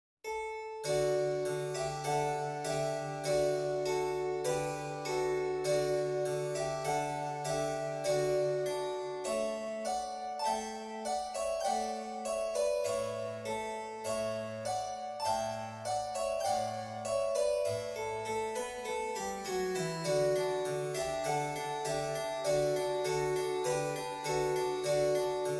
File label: overture harpsichord; Le déserteur; Dauberval (1784); Pantomine Ballet